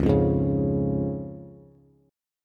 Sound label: C sharp Major, Bass; 9,8,6,6; strummed; JGuitar